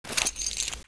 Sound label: grenade draw, weapons